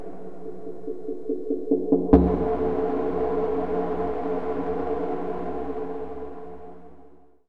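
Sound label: tormentor ambience; ambient soundscapes; dota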